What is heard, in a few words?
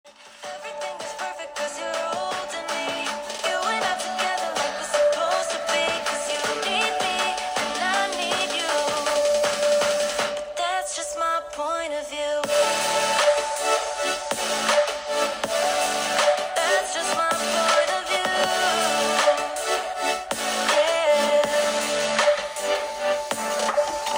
Xiaomi Pad 5 Speaker